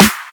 studio steel snare drum sound c sharp key

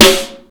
present steel snare drum sample C sharp key

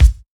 rich mids kickdrum sound b key; kick drum samples

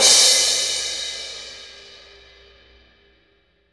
drum crash sound d sharp key, tuned cymbal

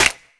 clap single shot g sharp key; percussion; single hits one shots

